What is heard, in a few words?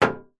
impact metal knock hollow high pitched